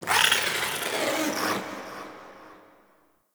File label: Lair Exterior Creature; Ambience; Misc Creatures; Sentient; Warframe